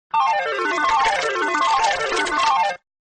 jackpot meme sound effect

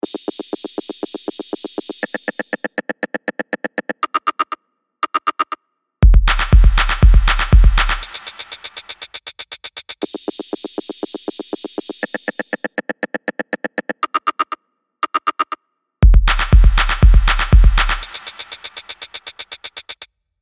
echo master

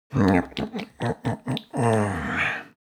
sleeping mouth smacking lips

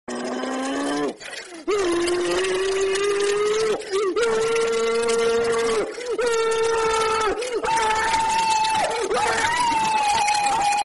driving and screaming

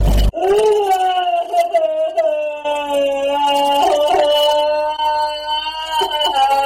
crying; gray sprunki

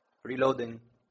reloading gun

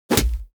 impact of a blow

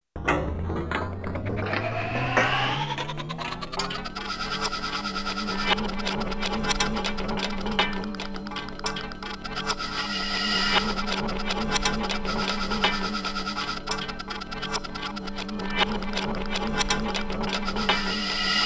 old machine

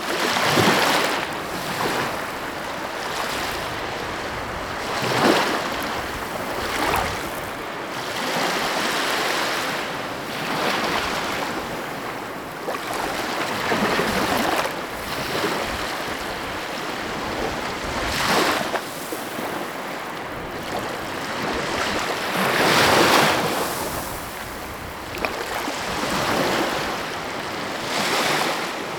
close sea waves loop

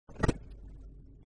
vine boom

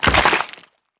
hatchling stomp

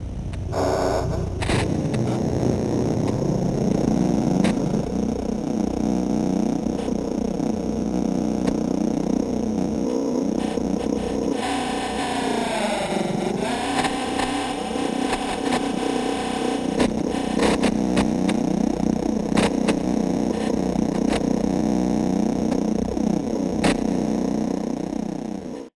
terror radio frequency

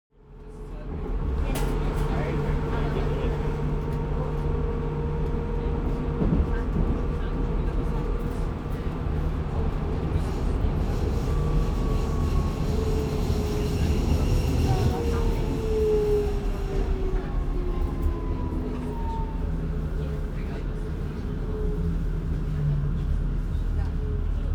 subway interior ambience

